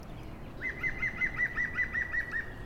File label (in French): pic vert cri